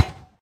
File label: ball wall; FoosBall